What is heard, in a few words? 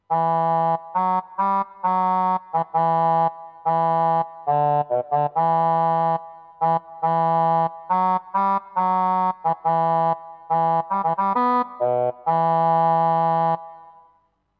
horn main melody